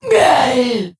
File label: Wound Crit; Tenebron